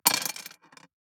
DICE on Glass, Throw and Roll, Standard, 4 Four Dice; Samples; ArticulatedSounds; DICE Sfx Party (Extended)